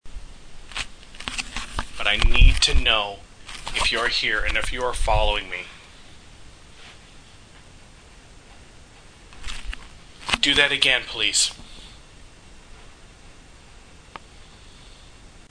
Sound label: sigh from bathroom